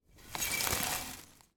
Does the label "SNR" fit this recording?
No